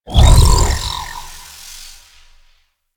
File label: Health Pack; Warframe